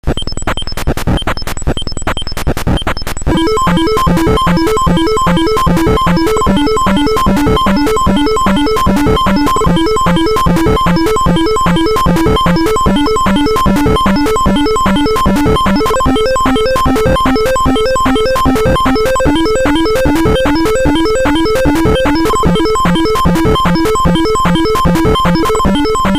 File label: atari jam; Synthcart